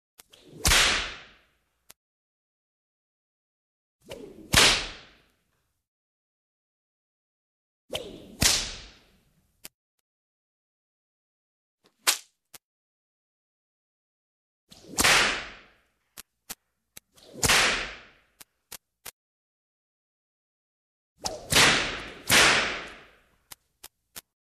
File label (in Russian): zvuki udarov knutom zvuki malogo knuta dvojnoj udar knutom